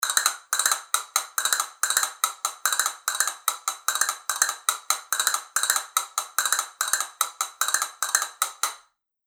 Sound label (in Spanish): castañuelas capricho vidrio doble caja